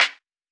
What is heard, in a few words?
hiphop snare, Effect on